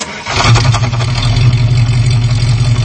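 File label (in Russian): мотор барахлит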